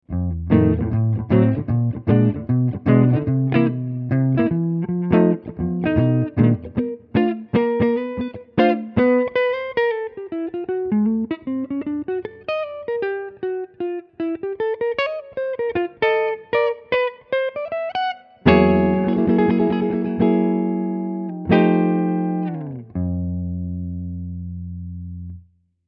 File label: Guitar